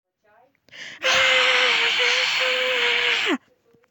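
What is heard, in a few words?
ukrayinskii krik, Ukrainian scream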